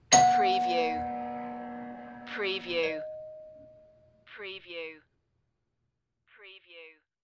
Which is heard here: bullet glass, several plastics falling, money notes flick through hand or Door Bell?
Door Bell